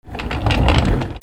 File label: OFFICE